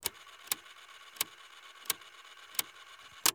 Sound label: Gear Foley Cassette Deck Various; FX; Cymatics; Eternity Sample Pack